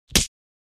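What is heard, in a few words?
slap sound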